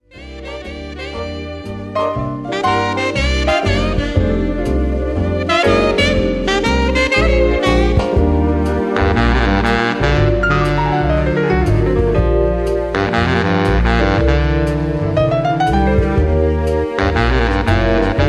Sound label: Baritone Sax